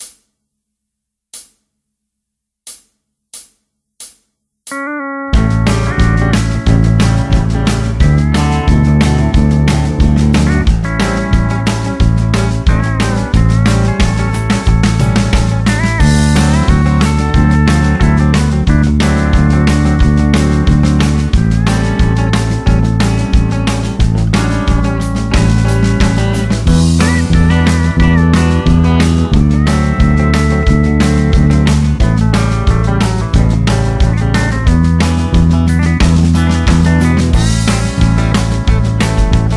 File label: Quarter Notes Song Playthrough 90BPM, Bass; Bass Road; Bass Guitar 101